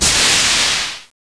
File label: steam; hydrophobia